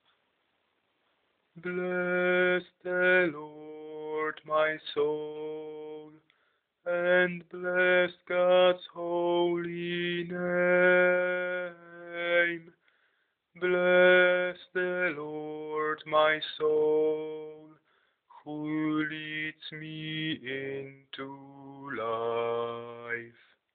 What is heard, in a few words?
Bless the Lord alt